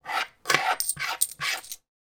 coins being counted sliding from surface into hand